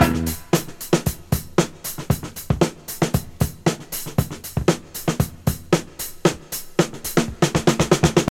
116 bpm fresh drum groove e key, kick tuned to the E note